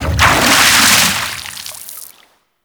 Forest Large Piston Water Splash; Props; Warframe; Grineer Forest

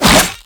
Spin Whoosh, Weapons; Infested; Coda Caustacyst

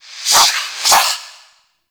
Death Quill; Warframe; Dante

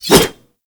Tonfa Whoosh; Tenno

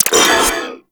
Mech, Weapons; Warframe; Cephalon

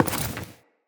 Walk Wood, Footsteps; Characters; Enemy; LadyDeora

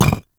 Footstep; Warframe; Qorvex

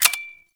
Glass Shotgun Fire Stop A; Warframe; Tenno

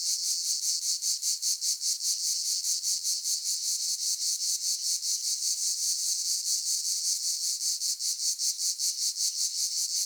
Insects High Loop; Ambience; Props; Warframe; Eidolon Plains

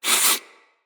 Sniff; Fable Of Garren Rood; Avakot

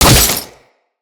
Condemn Spectre Impact; Warframes; Priest

